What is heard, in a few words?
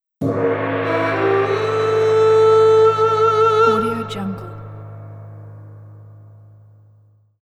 chinese kung fu logo